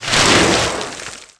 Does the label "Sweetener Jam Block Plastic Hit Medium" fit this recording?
No